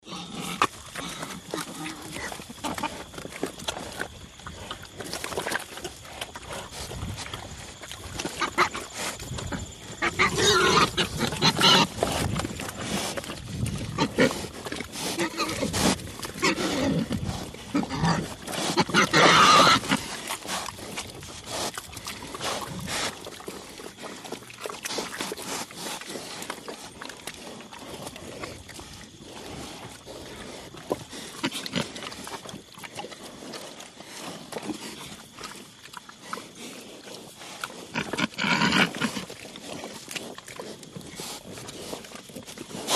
Pigs Feeding Sloppin

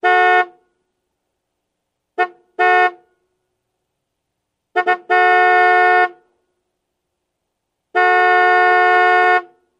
Car Horn Honks Large

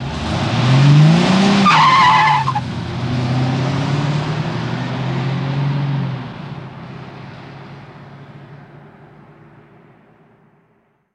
Cadillac Revs High

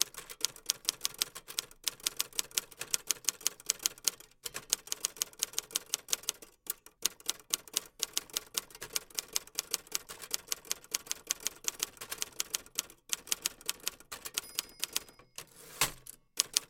Typewriter Continu; typewriters

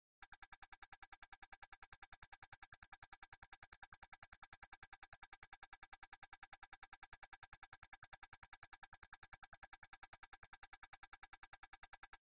Alarm Beep Pulses

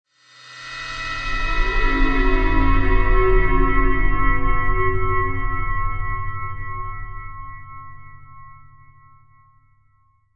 Feedback HF Elect Shi

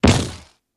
Camera flash, flash pot, sharper; cameras

